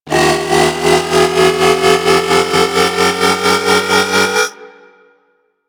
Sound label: Fighter Platform Raise, Ship; Ambience; Props; Warframe; Corpus Ship Remaster